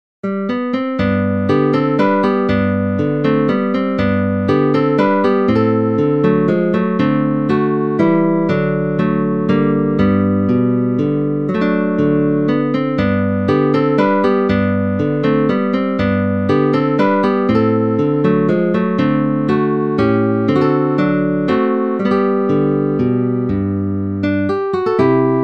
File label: Guitar Solo in G Major